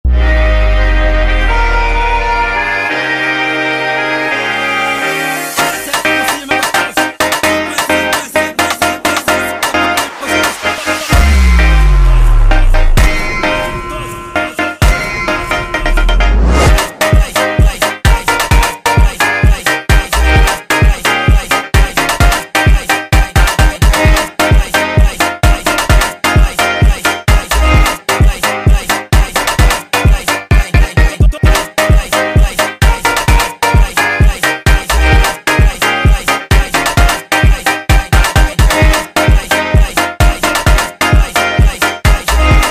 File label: Funk Woodwind